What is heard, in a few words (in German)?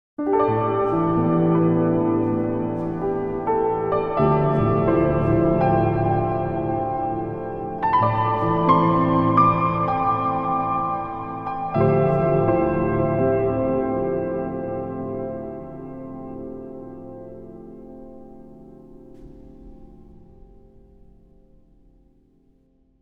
Klavier; FabFilter Pro R